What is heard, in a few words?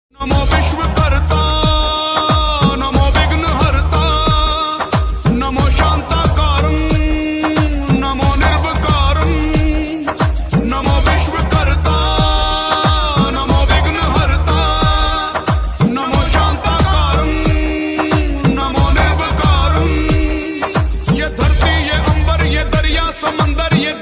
bhakti song ringtone